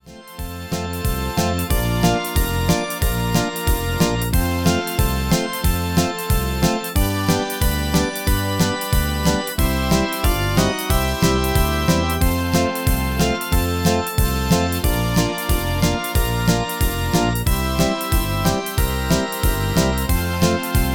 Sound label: MIDI